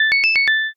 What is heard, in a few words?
departure melody